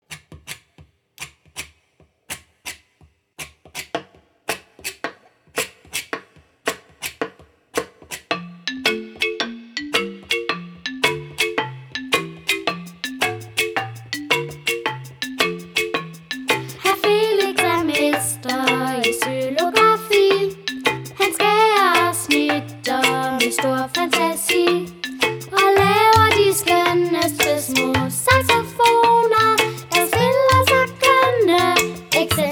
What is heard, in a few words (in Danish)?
Xylofon & Sax